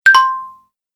Message Notification; High Tech

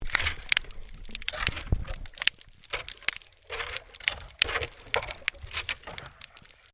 MAINTAINENCE WORK UNDERWATER SHOVEL SCRAPING

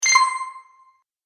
Magic Purchase Ding; Cartoon Sound Design

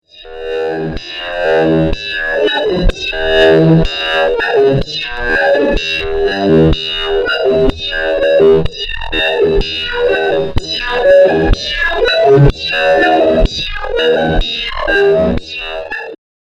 Dissonant Electrical Discharge; Laser Sound Sound Effects; science fiction sound effect

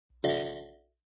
Ting, Metallic; Electronic

